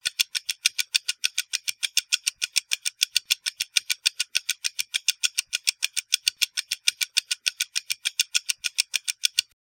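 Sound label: clock tick-tock fast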